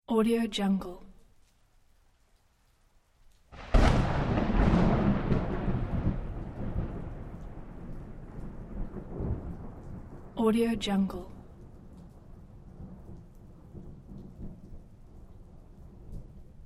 big thunder crack light drizzle; sound-ideas

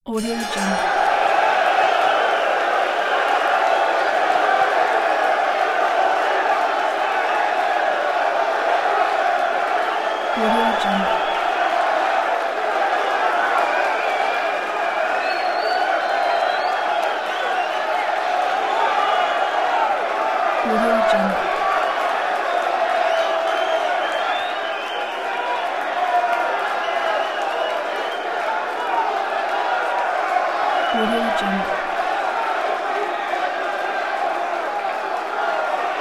boxing

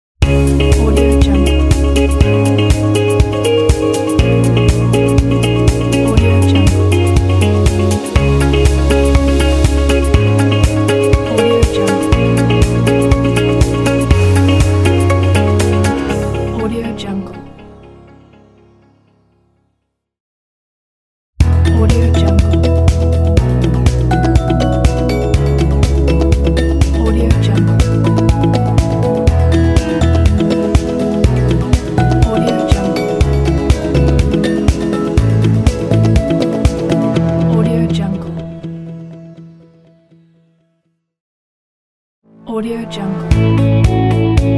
motivational and corporate ident pack

